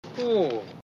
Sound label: ooh